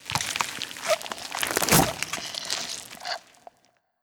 Revive; Skell

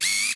Move

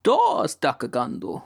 Exhausted; Quirrel

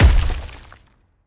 Earth Impact; Earth Quag Impact2

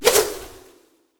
Ninja Attack Throw

